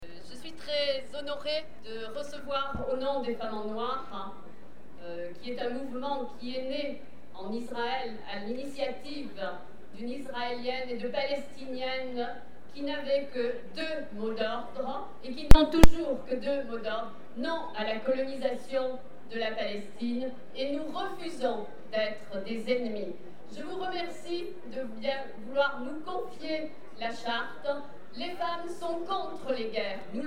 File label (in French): burkina femmes; burkina femmes noir 2005